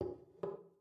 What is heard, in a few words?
put down beer; goldentavern